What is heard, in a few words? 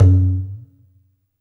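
ambience industrial, ethnic percussion; Drums In Your FACE!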